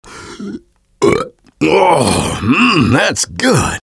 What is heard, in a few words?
guzzle burp; taunts; tf2; engy